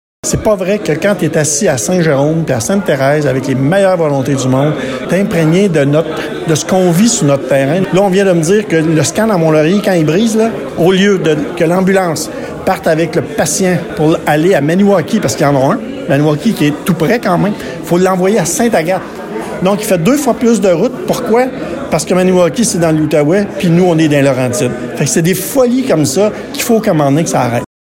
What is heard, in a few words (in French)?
BOURDON; HOPITAL